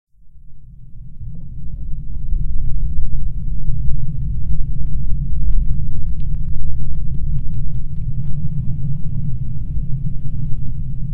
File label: rain